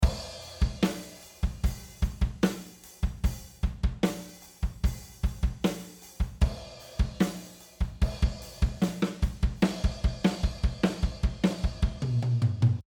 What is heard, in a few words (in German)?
Schlagzeug UA 610B Pre 10dB